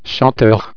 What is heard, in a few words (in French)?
chanteur